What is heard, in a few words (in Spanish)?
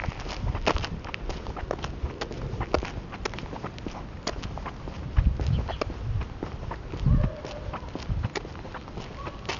Caminando